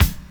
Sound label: Kick Hihat; Wiggle Disc